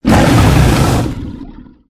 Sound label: attack hit